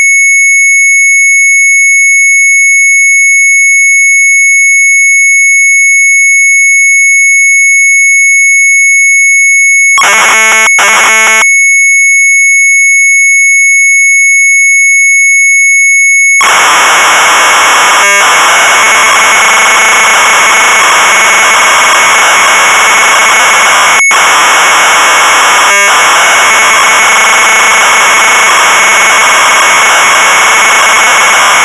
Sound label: Sharp MZ800 Tape; ALFABET; retromelsarchive